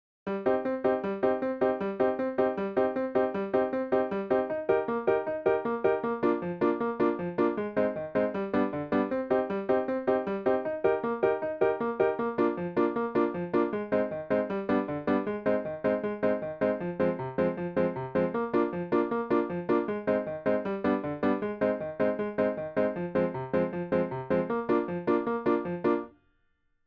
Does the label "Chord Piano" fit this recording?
Yes